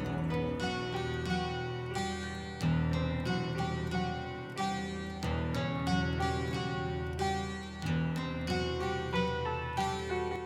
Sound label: guitar, Sad